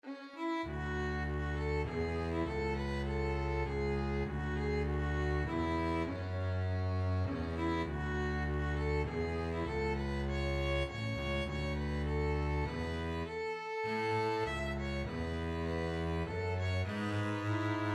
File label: violin bass duet